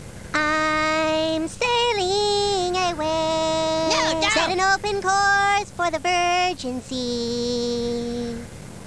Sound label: sailing